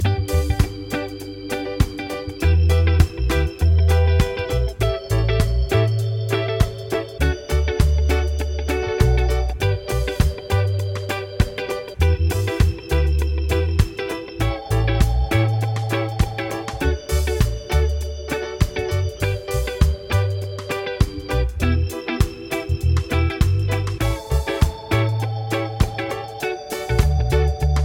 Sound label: progs with applied chords